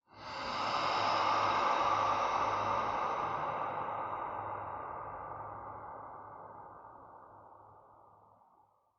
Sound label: cave, ambient; Minecraft